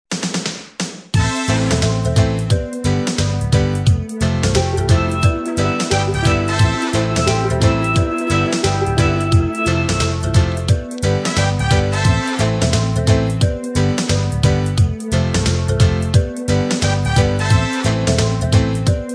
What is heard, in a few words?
steel and voices